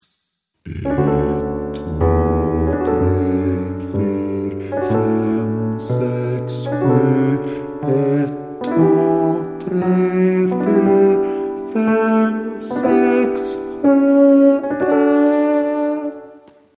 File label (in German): SATB Baslage